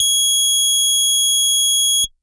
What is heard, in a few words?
Hard Brass DX100 Gs7